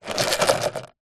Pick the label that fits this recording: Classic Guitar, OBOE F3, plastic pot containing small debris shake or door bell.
plastic pot containing small debris shake